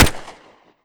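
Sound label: beta fire player, weapons; gmod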